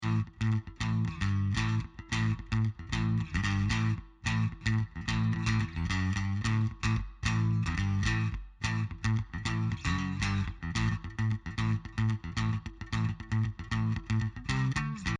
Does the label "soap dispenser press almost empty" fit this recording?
No